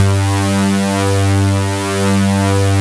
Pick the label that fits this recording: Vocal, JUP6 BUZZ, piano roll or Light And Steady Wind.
JUP6 BUZZ